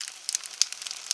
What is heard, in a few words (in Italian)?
fuoco, natura